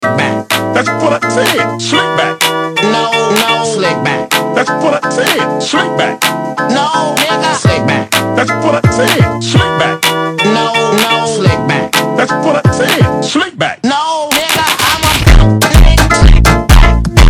FUNK Slowed

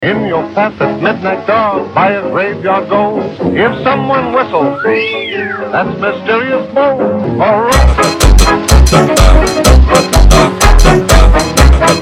FUNK Slowed

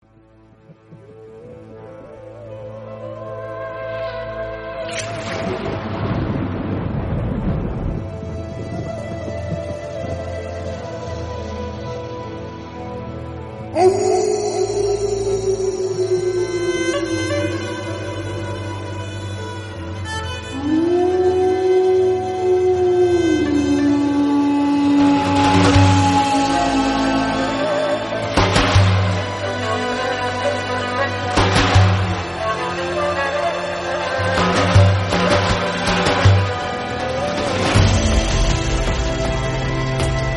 Instrumental Music

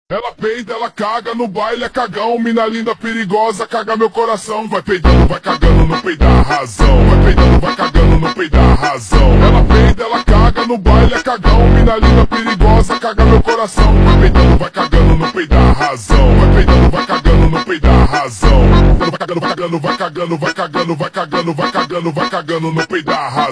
Super Slowed

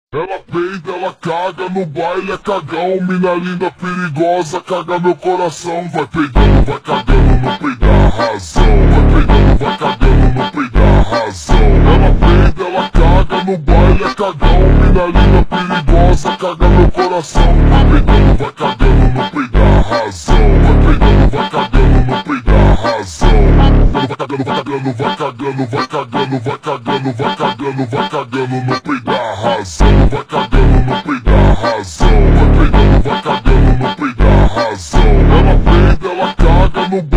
Mega Slowed

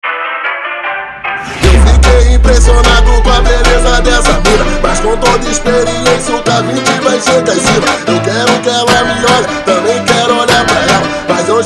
FUNK Super Slowed

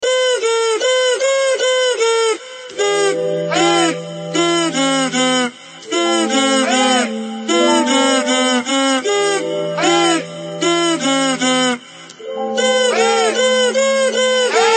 FUNK Super Slowed

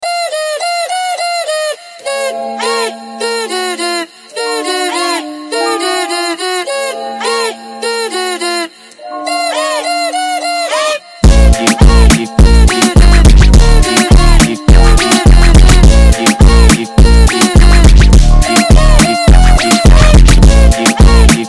FUNK Sped up

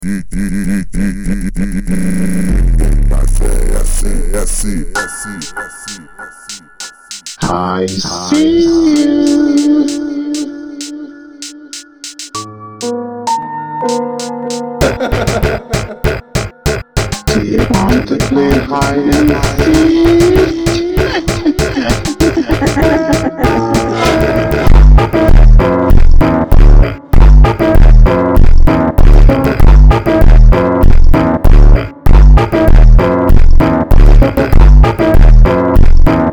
FUNK Super Slowed

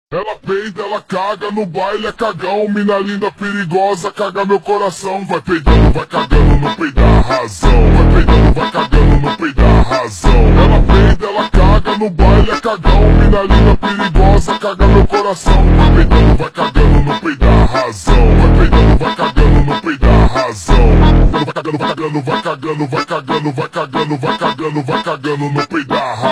Ultra Slowed

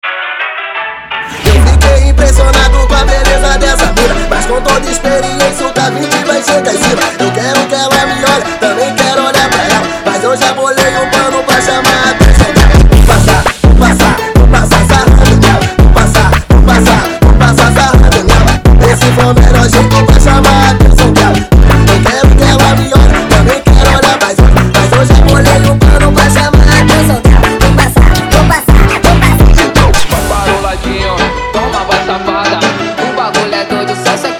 FUNK Slowed

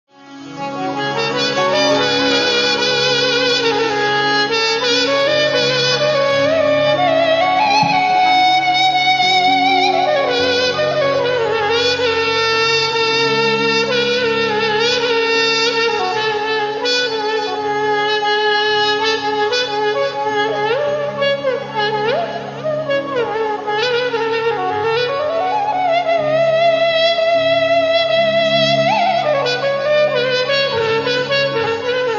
Instrumental

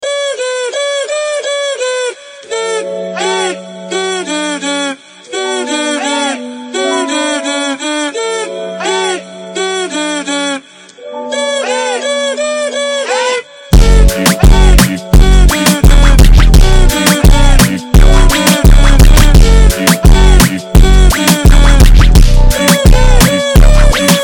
FUNK Slowed

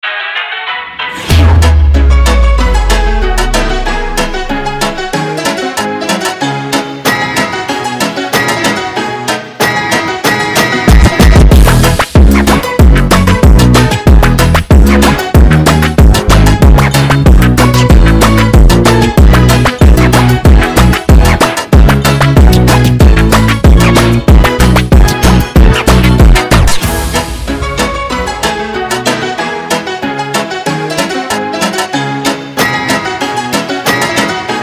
BAD ENDING FUNK Instrumental

